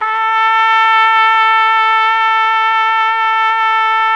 TROMPETE A 2, TRUMPET; Best Service; World Colours

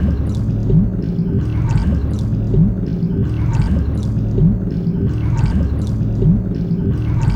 WASSER FX; Best Service; Advanced Mega Effects

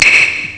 Geschirr; ST-XX Amiga Soundtracker Sample Packs

